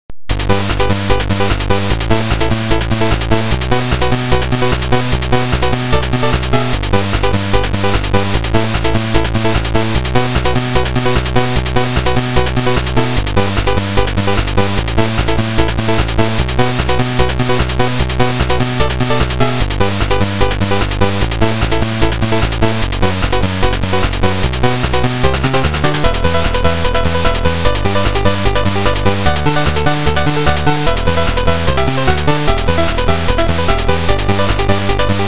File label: 8bit Race Music